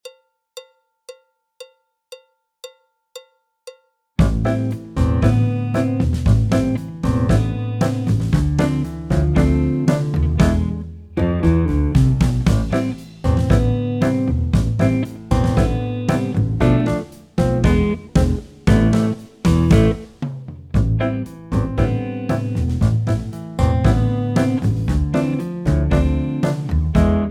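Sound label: Tango backing track, Jazz; clarinet, flute; Jazz Syllabus Level 2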